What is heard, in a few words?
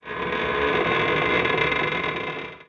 CREAK; PC Gamer IT CD 46